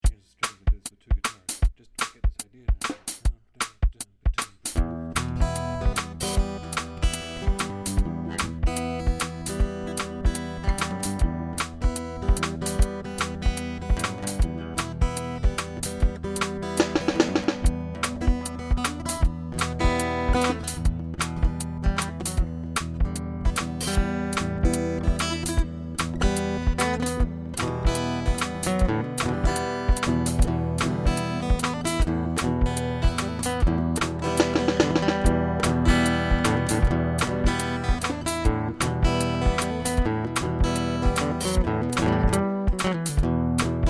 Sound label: steel jam mix drums